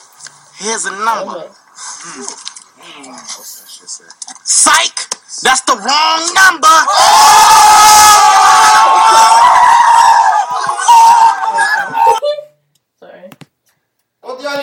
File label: ohhhhh